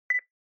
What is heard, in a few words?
Cell Phone Hardware Beep